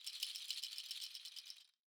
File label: rattles Roll, Raw Perc One Shots